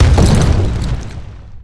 foot

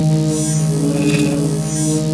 gauss precision charge idle; doom gauss

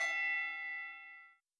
ding far

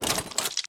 armor

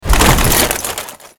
body armor rolling

